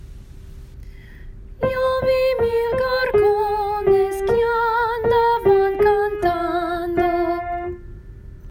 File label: solo